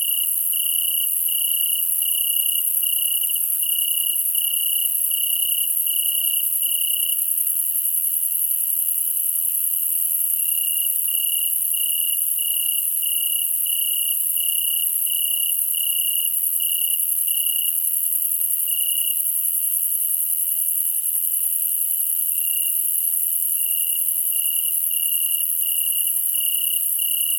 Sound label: Summer Night Ambience Insects Crickets And Frogs; Power Drill Massacre